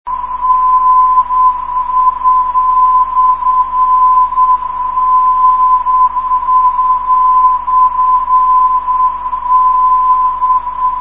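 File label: lsa 362 negative keying, non-directional beacon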